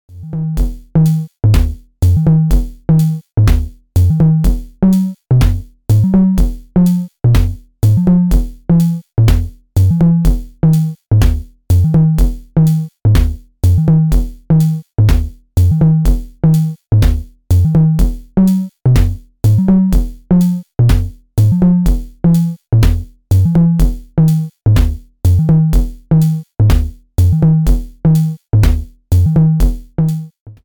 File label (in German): Erica Synths LXR Drum Eurorackmodul Unabhaengiger Pitch ueber Sequencer